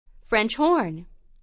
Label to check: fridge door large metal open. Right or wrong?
Wrong